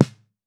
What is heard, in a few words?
Snare Lo-Fi; Drums; Ghosthack - Free Snares 2023